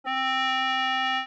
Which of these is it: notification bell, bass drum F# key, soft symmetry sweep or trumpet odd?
trumpet odd